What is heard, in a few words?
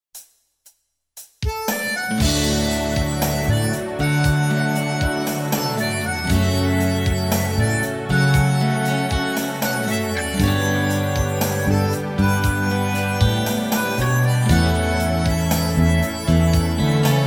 bgm